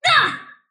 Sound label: sylph attack short; dota; dark willow